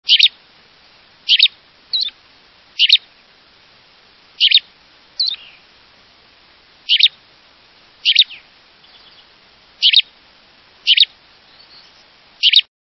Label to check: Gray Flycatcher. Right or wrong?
Right